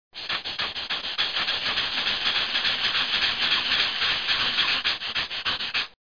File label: pAnting